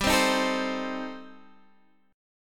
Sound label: D sharp 6th Flat 5th